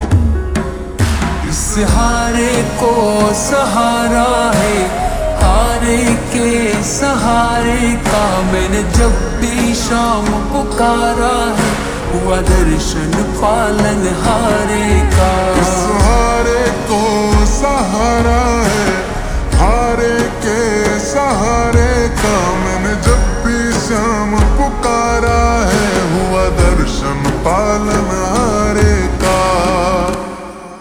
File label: Ringtone slowed and reverb